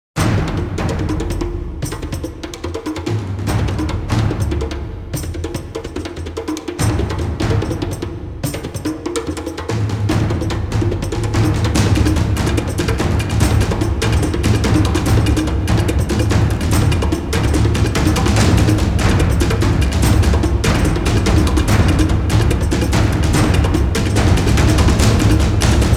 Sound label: combat opener